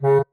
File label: marimba penguin; ゆめ2っき